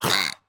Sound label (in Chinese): 海豚攻击声